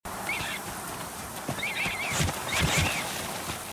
hasen; The Flame in the Flood

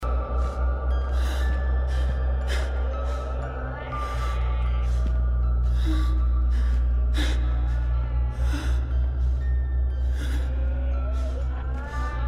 atem; Neverending Nightmares; gamesoundopedia

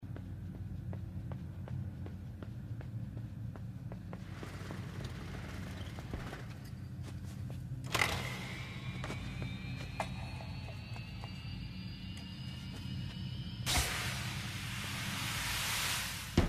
metalraum; inside

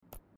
auswahl ingame menu sound; Life is Strange 2; gamesoundopedia

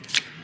ziehen von deck; Hearthstone: Heroes of Warcraft; Blizzard Entertainment

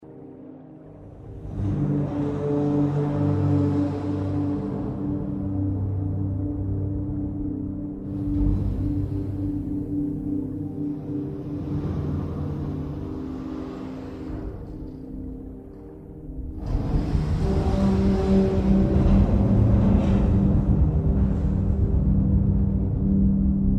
tuere auf zu unterwasser; inside

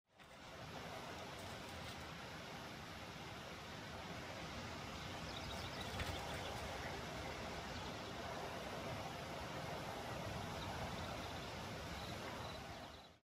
wasserfall; divinity original sin ii

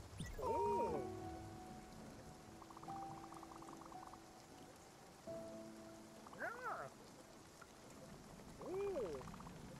Dialog Blasen; spiritfarer

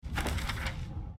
playerjournal oeffnen uisound; divinity original sin ii

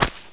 laufen; snail against squirrel

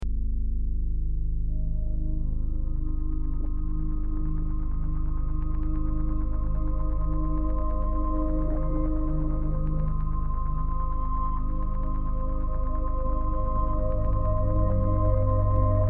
musik angespannt; life is strange; finding rachel

